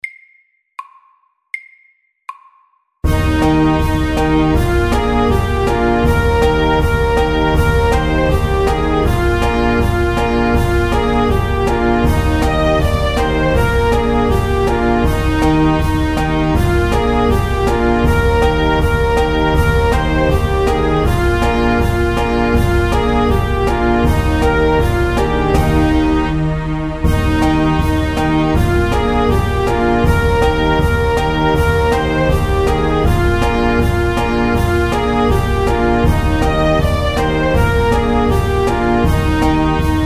Cancan, 80

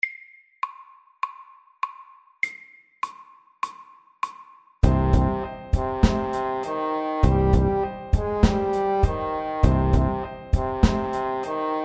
A minor rap SLOW 100

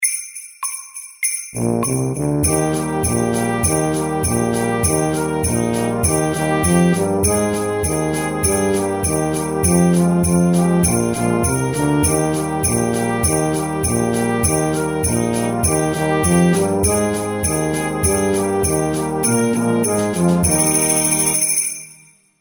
Jingle bells FAST 100

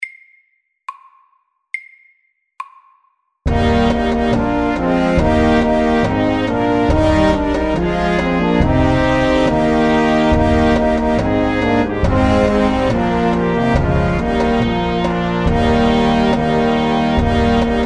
Ronde FAST 70

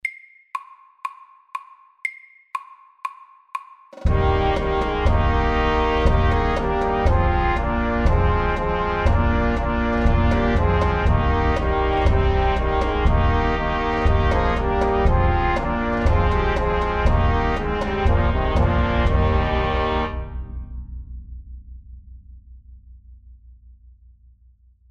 Ronde MEDIUM 120

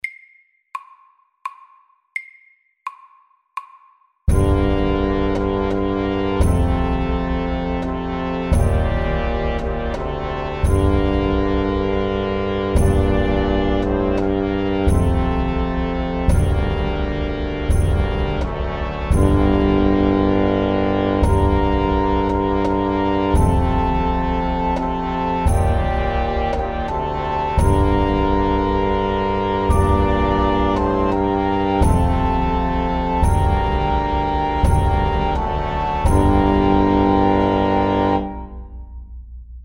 Hemiola, 85

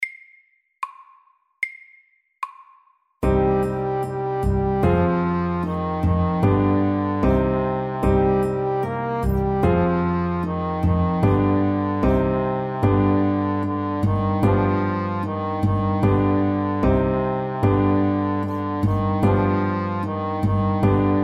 SLOW 75

